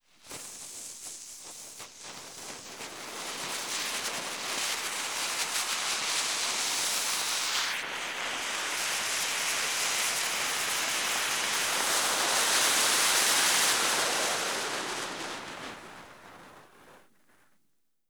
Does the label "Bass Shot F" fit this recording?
No